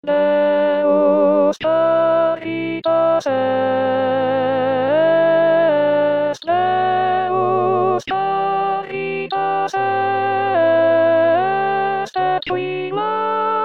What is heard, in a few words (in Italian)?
solo ritornello contralti; voci guida; corali in vaticano 2024